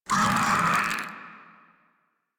Desert Skate Vocal Death C; Warframe

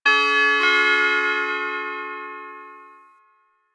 Computer Recap Start Up; Entrati; HackMinigame

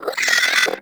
Hyena Micro Vocal Angry; Warframe

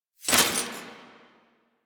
Mech Release, Rifle; Weapons; Warframe; Thanotech; Trumna Prime

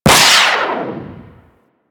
Skate Dash; Enemies; Skate Movement; Corpus Modular; Warframe; Corpus Modular Crewman

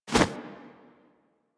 Arm Swing Foley; Enemies; Warframe; Purgatory Warrior

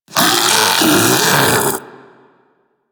Infested Splitter Split Top Vocal Death; Warframe; Grey Strain

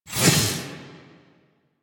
Archwing Scythe whoosh small; Melee; Warframe; Weapons; Exalted Archwing Scythe

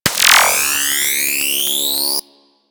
Burst Laser Charge Up; Warframe; Teralyst; Ropalolyst; Abilities

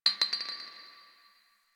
Garbage Glass Drop; Warframe; Ambience; Nineteen Ninety Nine; DE

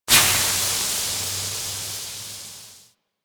Bridge Steam Release; Warframe; Liset; Hub

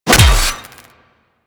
Melee Hammer Impact Robotic Flesh; Warframe; Weapons; Hammers

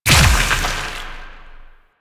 Impact Large; Weapons; Warframe; Duviri; Incarnon Adapters

